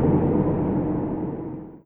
music off; ゆめ2っき

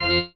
marimba grave; ゆめ2っき

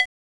Saw A#3

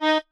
marimba chainsaw; ゆめ2っき

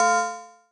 marimba; ゆめ2っき

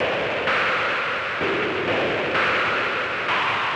bgm, Music; ゆめ2っき

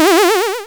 8bit

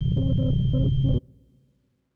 low drone; ゆめ2っき

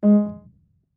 marimba piano; ゆめ2っき

